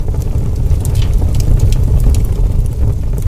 SFX Loop Fire

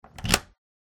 door unlock; SFX; doors

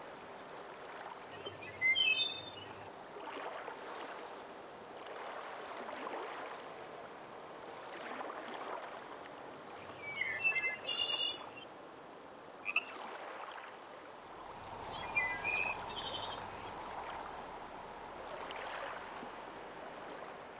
ambience loop; Worms 3D

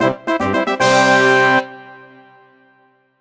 Jingle, Achivement Complete; streetpass mii plaza; 3ds

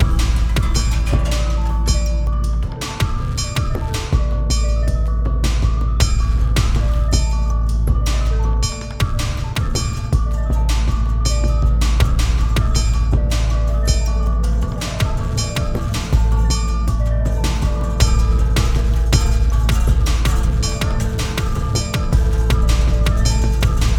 Music (Gameplay State Fight); Music Hideout4; H4 Level Area=Office Escape End; Fight Office3

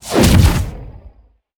Cannon Explosion

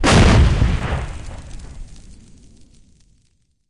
Missile Explosion; Lethal Company

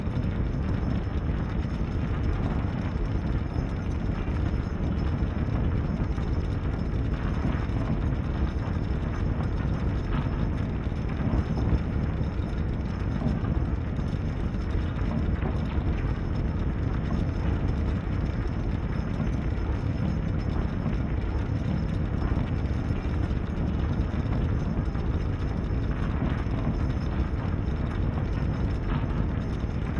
Boss Machine Loop; helltaker

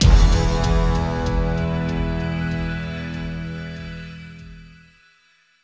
Combat Option End; bolt 2008 pc gamerip

